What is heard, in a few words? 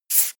marker line short heavy